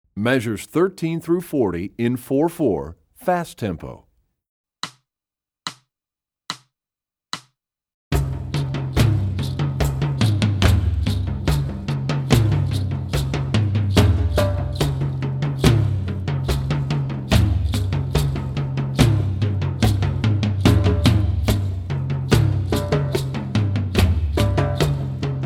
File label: Rhythm Studies, Percussion; EE2000 Book2